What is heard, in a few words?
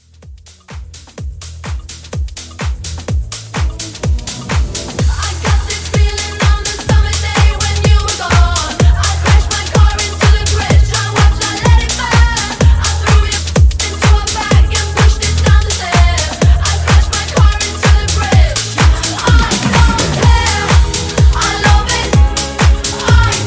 Hype 126